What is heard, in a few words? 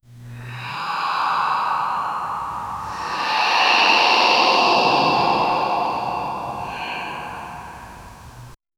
ghostly whisper in an empty hallway